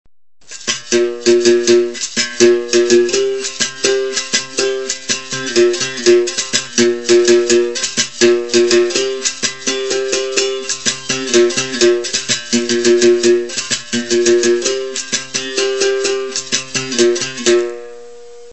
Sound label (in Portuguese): Toque de Santa Maria, capoeira; Tico tico